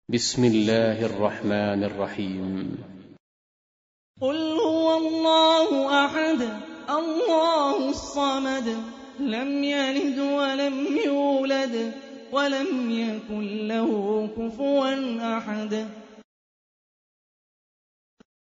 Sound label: Quran; Hani Refaey